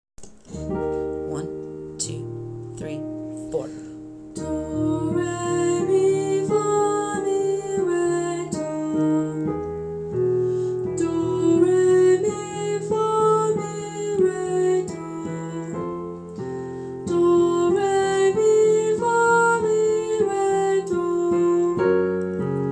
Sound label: Hmm Solfege